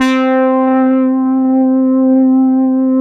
DW8 DS C4, Korg DW8000; Back In Time Records; Korg Universe VOL-1

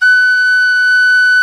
WHISTLE, Ireland; E-MU Producer Series Vol. 7 – Old World Instruments

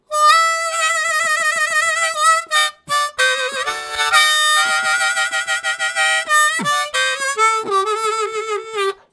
Hard Vib, Harmonica Riffs; Zero G - Harmonica

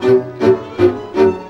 VIOLA GROOVE; Best Service; World Colours

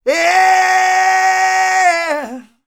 R ROCK, MALE HUMAN; ILIO; Vocal Planet

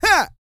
GRUNT, MALE HUMAN; ILIO; Vocal Planet

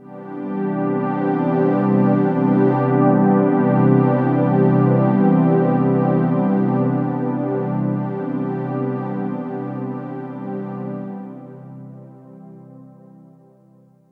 Ambient Pad, Drum & Bass Melodic Synth

